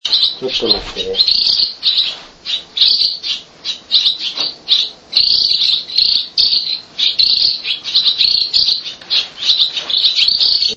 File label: Tsubame eating